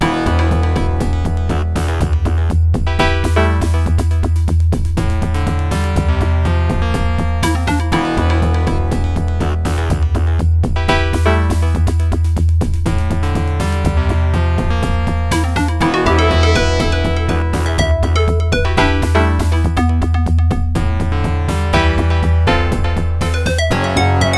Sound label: StarPort BBS intro tune, AdLib sound card